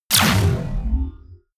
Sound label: sci-fi plasma cannon fire; schusswaffen